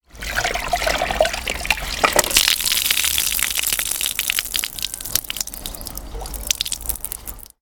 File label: Wringing Wet Cloth Onto Paving